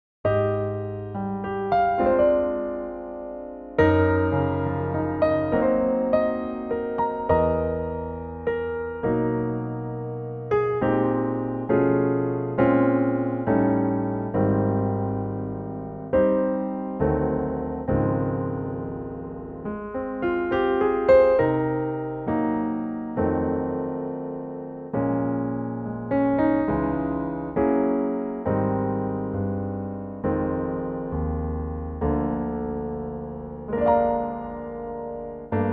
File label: Ab piano; key - Ab (ends in Eb) - vocal range - Bb to Eb